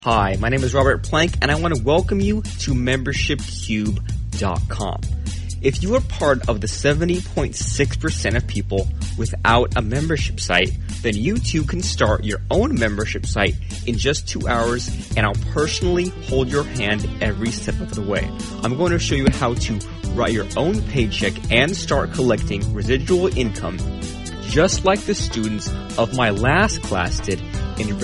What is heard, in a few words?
intro music